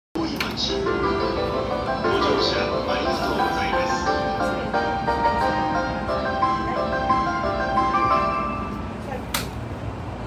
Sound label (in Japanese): JR京浜東北線品川北, train sounds